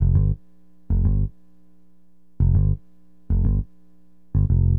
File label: BASS, Acid Jazz Funk